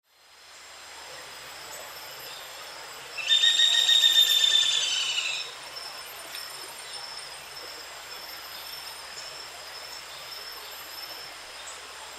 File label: bamboo woodpecker